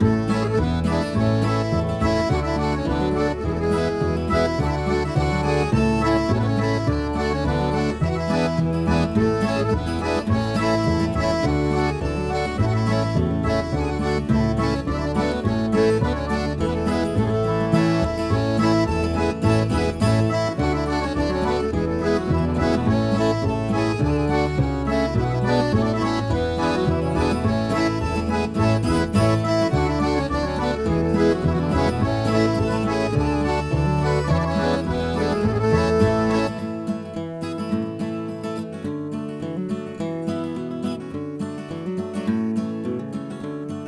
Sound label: Town Tavern